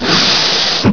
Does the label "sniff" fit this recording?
Yes